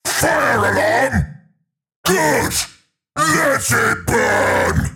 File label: Alarms Fire Alarm; WarhammerChaos